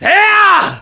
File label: Spin Attack; Link's spin-attack shout